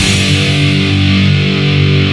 rasp guitar; Amiga-CD 1996 #5-6; sampler; guitarsamples1

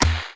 menu; Kingpin

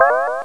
Strings; BabySmash 5.4

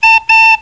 Toot; PUZMANIA

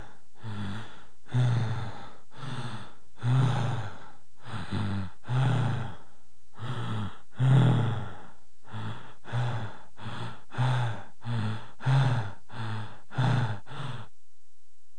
breath

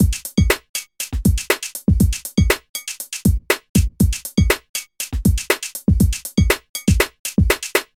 beat; Sonic Foundry

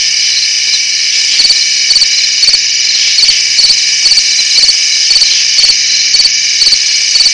crickets

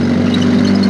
tankloop; TeamAlligator

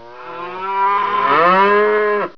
Cow; KRYSTEN

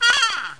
Monkey Splat; Bible Fun Pack; NOAH

